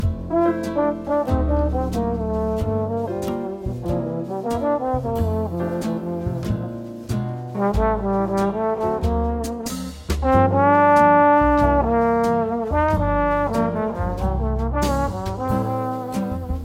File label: Lounge Jazz Instrumental